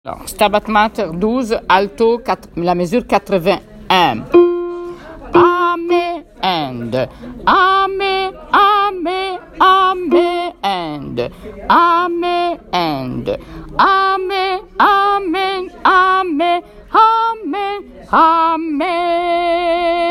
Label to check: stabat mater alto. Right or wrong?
Right